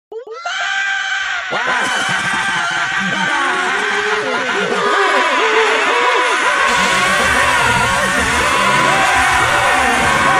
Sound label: screaming; cave